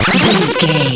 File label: retro powerup collect